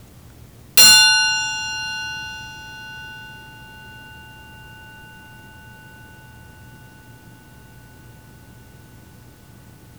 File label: triangle